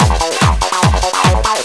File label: loop